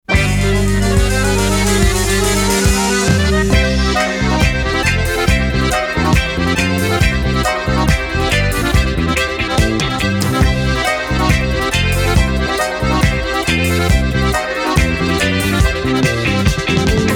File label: Disco